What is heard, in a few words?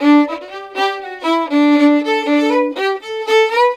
violin, Celtic Flavours